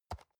storage grab table soft, box; objekte

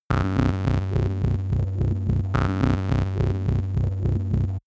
abstract tension, energie

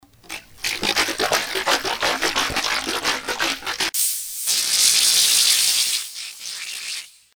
bottle joggle overpressure; haus garten; kueche

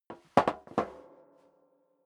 step sneaker metal rattle scuff; schritte

